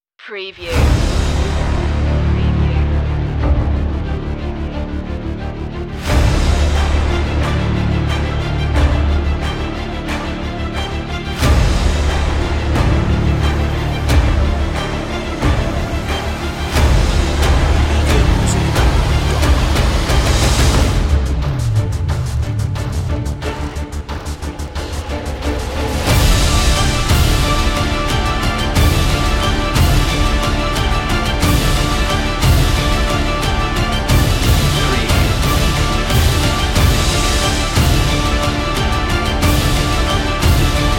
enemy ground no choir

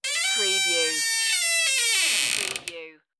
old squeaky wooden door

